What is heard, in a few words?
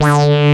MOOG LEAD, Moog Synths; E-MU Formula 4000 Series Vol. 3; Analog Odyssey